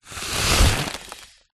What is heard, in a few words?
foley air blow into inflate plastic bag